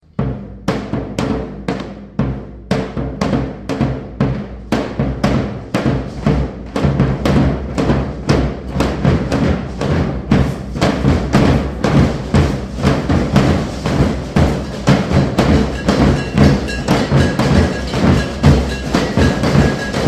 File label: samba maracatu rehearsal